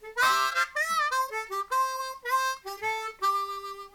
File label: Harp